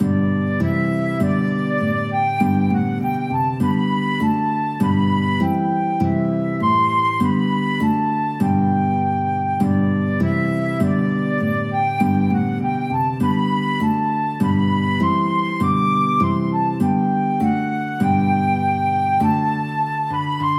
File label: guitar flute, guitar flute duet